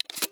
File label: sawedoff insert shell; weapons; Counter Strike Model Pack; CS2